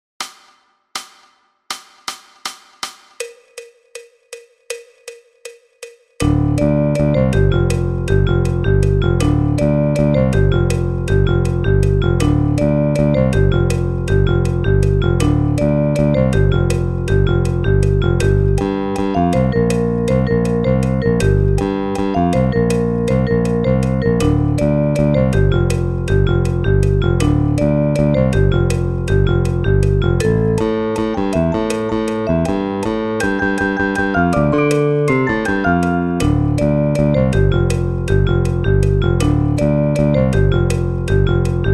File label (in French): metronome a 160 bpm